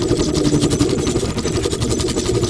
barrier projectile; deadlock

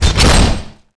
zombie wall, claw; tayo escape

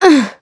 pain; fade

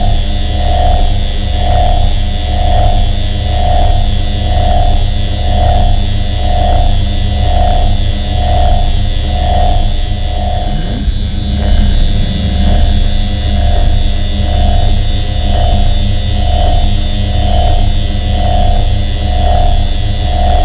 barrier idle; deadlock

